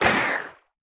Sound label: creature symbiote flinch